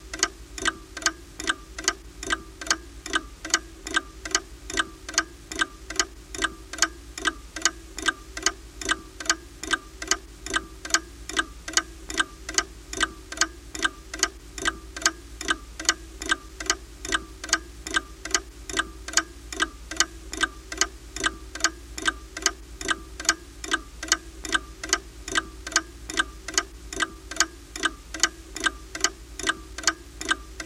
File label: Mantel Clock Int Ticks